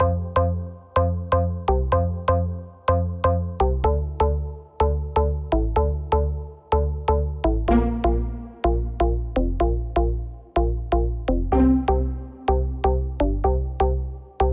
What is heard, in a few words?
short instrumental version; devlab